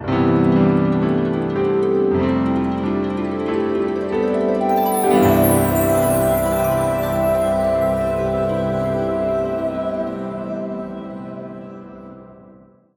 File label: gameover; Manamon Music; Manamon 2